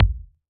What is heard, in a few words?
Ball Collision Geo Tackleball Med